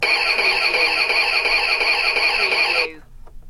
Toy Ignition

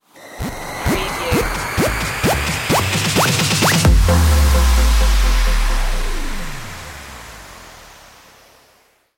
dutch influence stinger

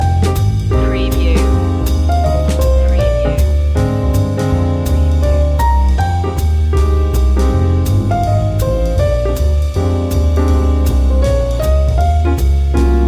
in paris loop

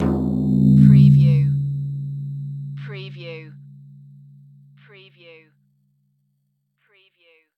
Cartoon Drum Tympani Weird

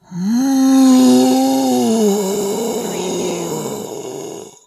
Zombie

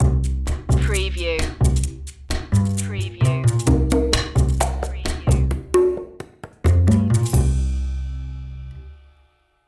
jazzy opener underscore

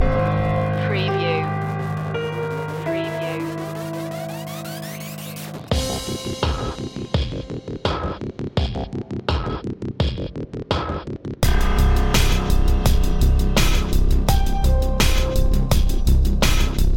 broke apart inside loop

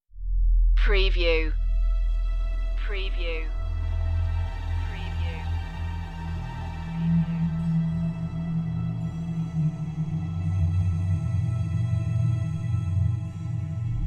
the trolls cave no piano

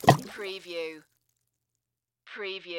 Splash Rock Drop

